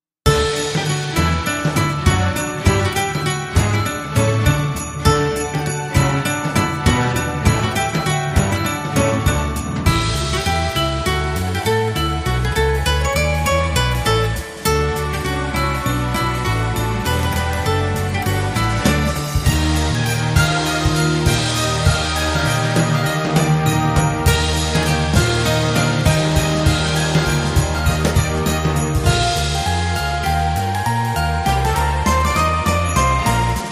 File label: Deck the Hall (jazz classic) (High)